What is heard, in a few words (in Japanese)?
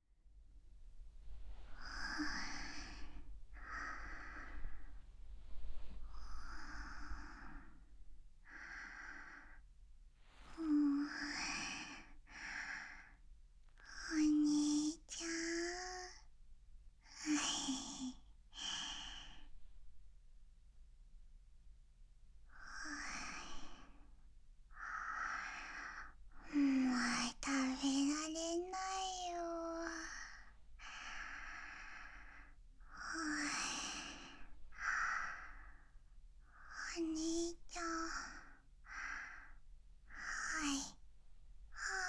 おやすみ 寝言寝息loop; おやすみ30分